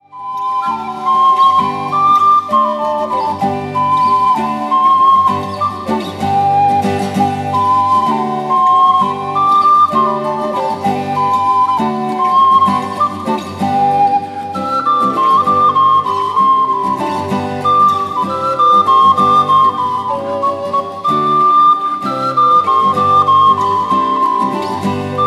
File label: polska; hakuba